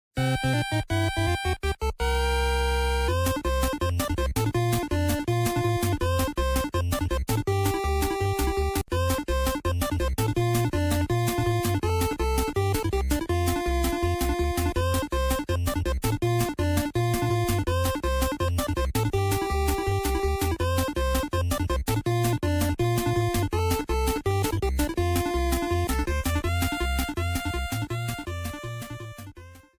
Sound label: Bonus Music; Link